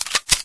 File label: famas forearm, weapons; Source Engine; cstrike